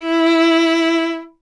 STR VLA, Viola; Miroslav Vitous

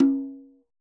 tom, Roland MC-909

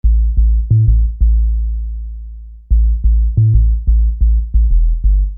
Low Sub; Construction Kit; HIP-HOP; Sonic Foundry (Sony Creative Software)

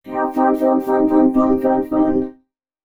female c#, Dance Ultra Voice FX; Mutekki Media

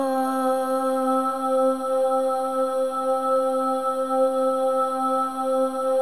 SOLO BOY, AH; Spectrasonics; Symphony of Voices

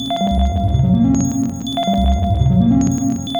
BLEEP 3, SPACY BLEEPS; Zero G; Malice In Wonderland

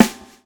SWNG

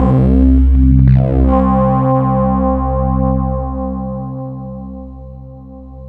PPG wave C3; Zero-G Ambient 1; PPG WAVE #2

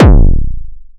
Borg Kick, drum kit; Polyend; Stazma & Concrete Collage; Concrete-Dual Borg

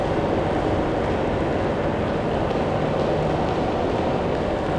Cracklature, sparse soundscape

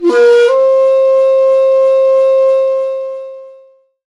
Dance Synths; Best Service ProSamples vol.37; Ethnic Synths

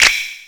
Latin Layers, drum machine

